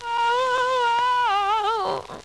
"oh" SUNG; ooh ahh

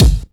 Kicks Loud

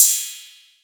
Cymbals Electro

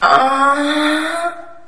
female orgasm

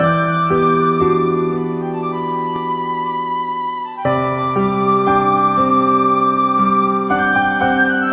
flute, Jazzmatazz Loops

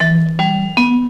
vibro

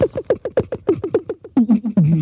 electric toms, Beatbox; Drum loops

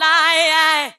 lieyai, Voice ooh ahh

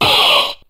huh group of men

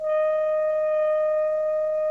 tape tone; Misc Percussion

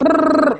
brrrrrr, Voice

